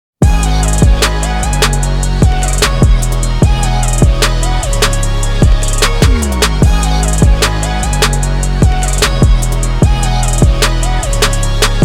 Instrumental

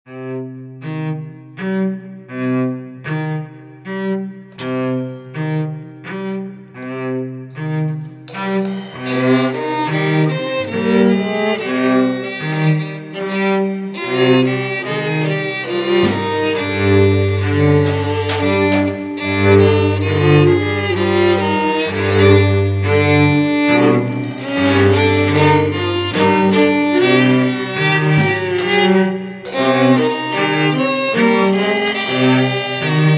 drunked waltz

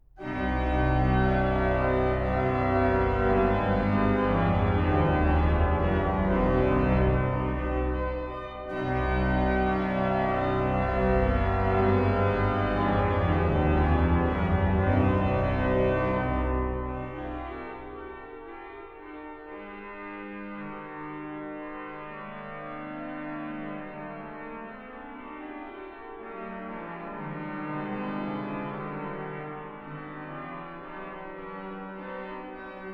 Organ Works, Harmonium Ou Orgue

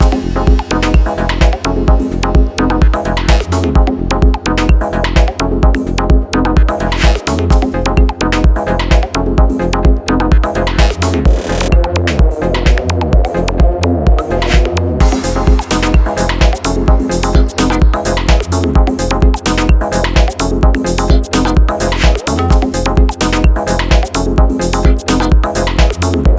Hard Progressive House Mix

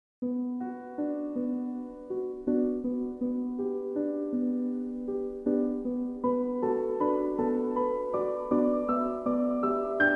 Calming Music for Dogs

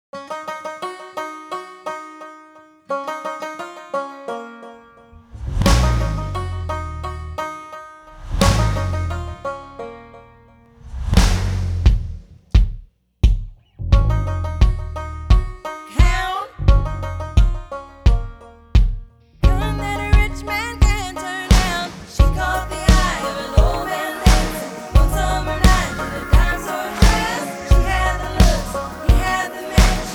Karaoke Version

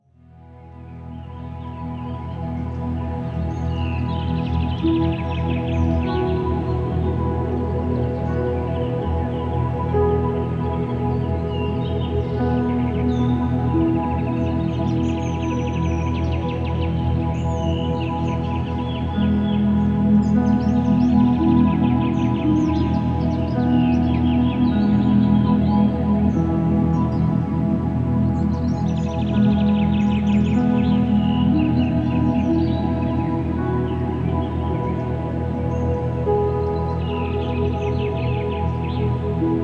Ambient Music For Reading

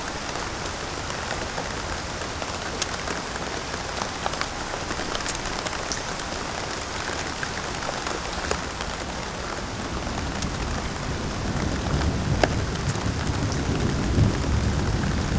Meditation Music, Thunder Rain

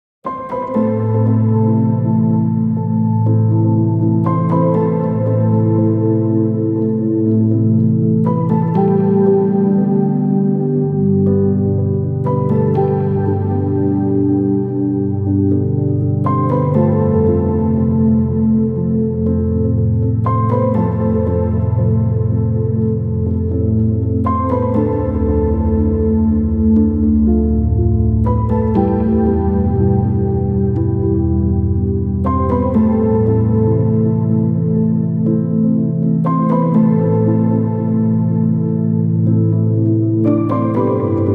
Piano

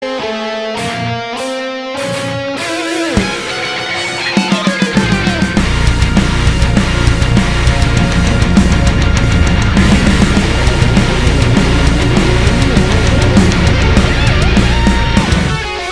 Metal Cover; Plantera Terraria

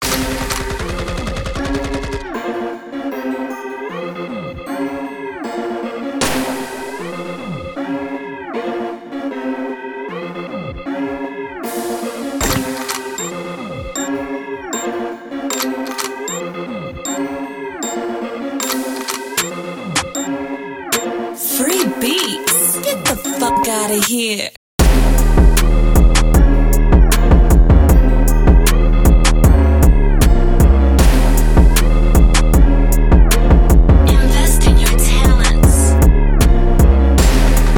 Type Beat

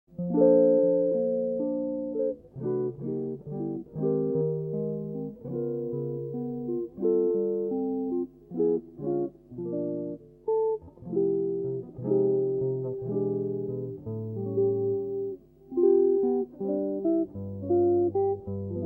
Hammond Organ Trio

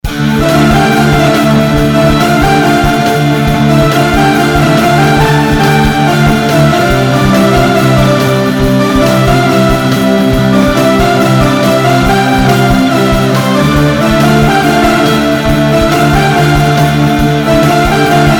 Power Metal

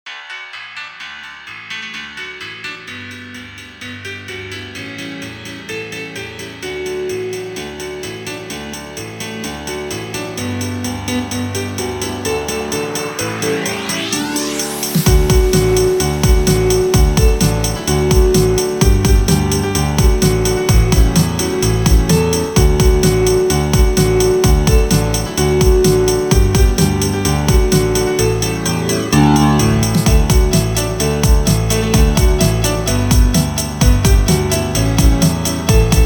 Jingle Bells Mix

